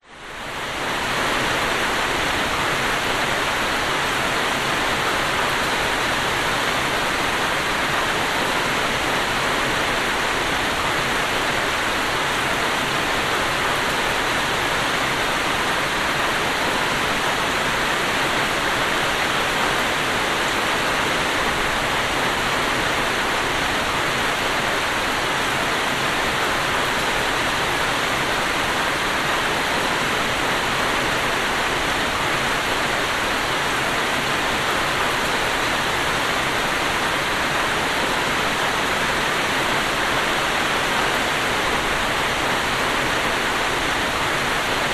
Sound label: nature rain heavy roof house metal under veranda